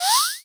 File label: digital sweep